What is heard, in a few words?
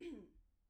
clear throat; IEEE AASP